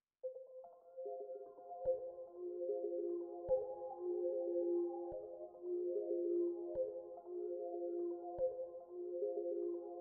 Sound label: 147 BPM F Min Gross Plucks; Melody; RnB; Loop Stems; Cymatics; 2022 Melody Collection; Limelight